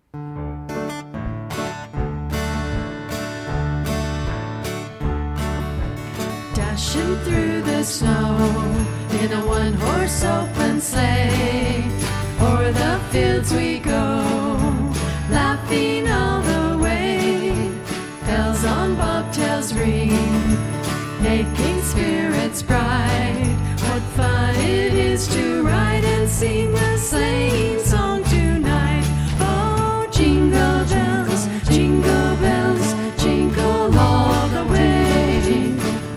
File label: Jingle Bells; Christmas Practice